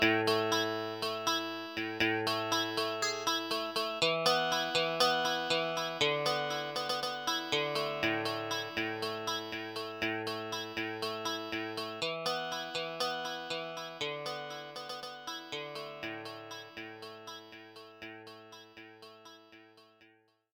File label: guitar dry